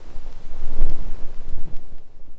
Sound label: dirty vinyl, Scratching and Turntablism; DRUM LOOPS and BREAKS